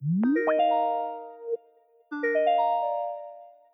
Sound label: ringing; Microsoft Office; LYNC